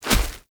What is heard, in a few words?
Wolf Step, Animals; Duviri